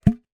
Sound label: foley lid metal remove pop off card tube container